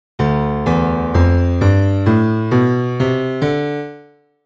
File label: D dorian mode